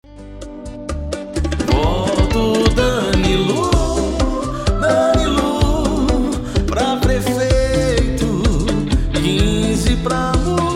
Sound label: jingle